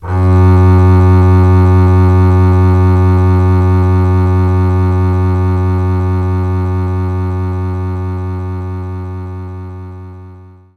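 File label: Double Bass F sharp 2; Knights Try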